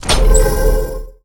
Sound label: Magic; conquer